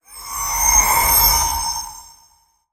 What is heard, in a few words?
Elementaliste Spell